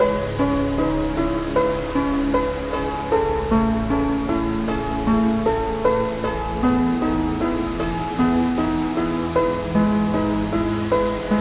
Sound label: piano